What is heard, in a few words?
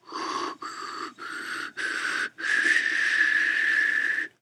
air